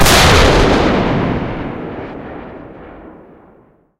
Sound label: cannon 105mm l7 shot, cannons tanks; War Thunder